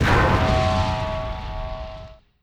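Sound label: Rivet Hit Interior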